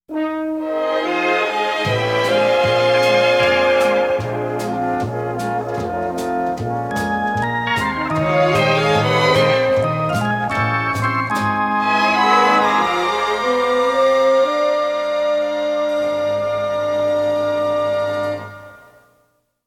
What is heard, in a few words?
Bed; Pams